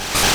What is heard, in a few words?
Wing Attack Single; Pokemon; Gen 1; attack moves; RBY